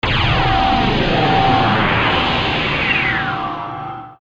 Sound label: Transition; Genesis; Sonic CD - North America Soundtrack